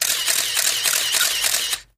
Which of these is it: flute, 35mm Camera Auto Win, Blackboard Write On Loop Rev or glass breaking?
35mm Camera Auto Win